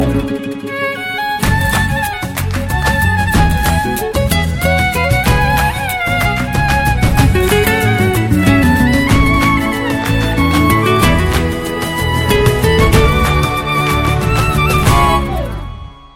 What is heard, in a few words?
latin ringtone